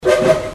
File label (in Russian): korotkij zvuk vipuska para